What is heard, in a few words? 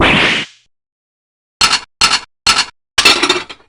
damage; olga